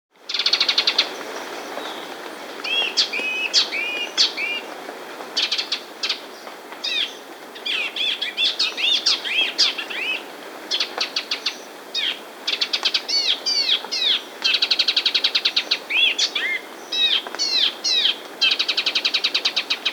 Half-Collared Gnatwren (Microbates Cinereiventris), Andean Birds; S. America